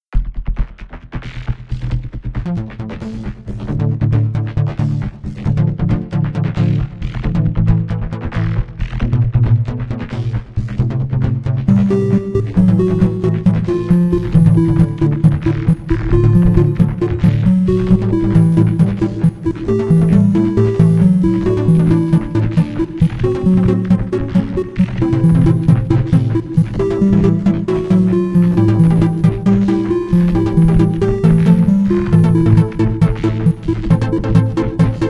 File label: arpeggiated sequencers; weeklybeats 2012